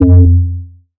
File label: Bass F, Bass Synth